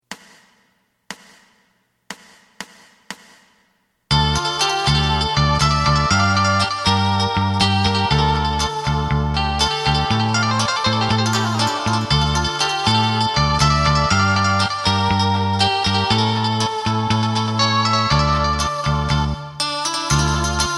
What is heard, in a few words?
drum; エレキバンド用カラオケ